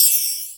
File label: Hats Electro Open